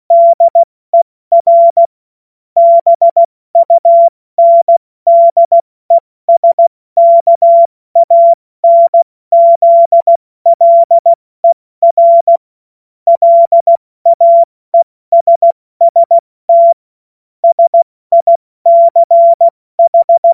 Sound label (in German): Witz, Morsekurs, cw morsen; Tempo 70 F80; hamradio; cw witze